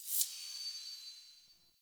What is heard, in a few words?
UI Progression Burst Particle Sound